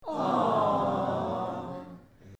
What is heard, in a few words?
Crowd-Quiet Aww; Small Crowd , Mixed Group Of Men And Women Say " Aww " In A Hushed Tone; Exclamation; Voice